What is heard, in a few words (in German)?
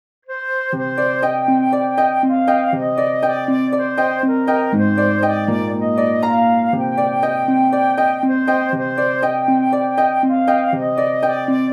God rest ye merry gentlemen Harfe und Melodie